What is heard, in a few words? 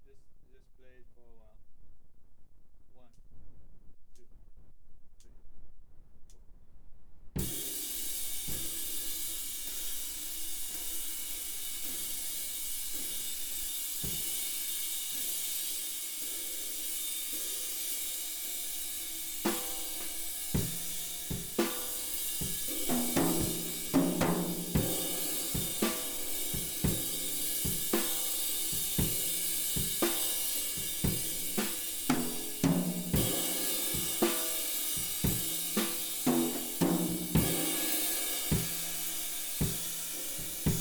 Kit